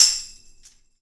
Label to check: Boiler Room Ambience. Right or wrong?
Wrong